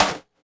Snr Spls, Roland MV-1200

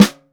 SD, JAZZKIT

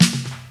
SNR, DANCE KIT; Crypton - DrumBase5000; DANCE KIT #3

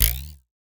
Spoon, Foley; Foley Fx; cartoon; musicradar; cartoon caper

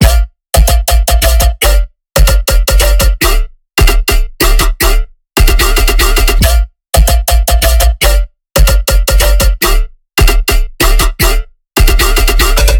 colorbass kaleidosco

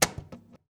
CAJON; Univers Sons - Raricussions

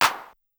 CLP, Sonic-Potions LXR; 909Pt1

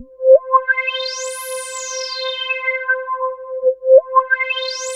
Korg POLY800 C4; Back In Time Records; Korg Universe VOL-1

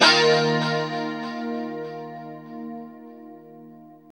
2CHD D#4, Guitars; Spectrasonics; Hans Zimmer Guitars VOL-1; JC-SHEEN

